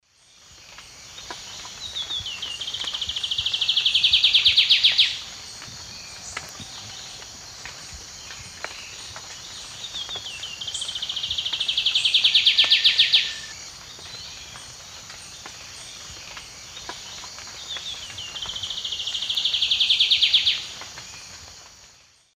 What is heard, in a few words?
Basileuterus fulvicauda, song; S. America; Eastern Lowlands